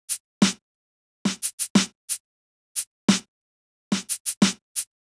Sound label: Drums, 090 Cmin; Hip Hop Exotica; Apple Loops; Big Fish Audio